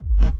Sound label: BD Gate FX, Bassdrums; Vengeance Samples